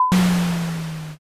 auto door

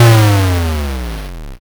explosion

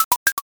reload

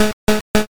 picoeat

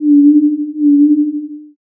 owl

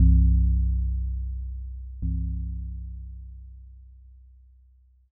temple bell

